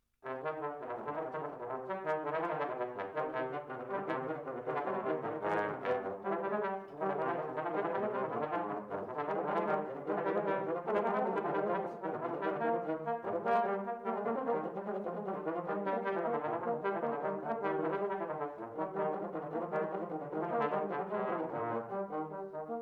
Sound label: trombone; Trombone Duets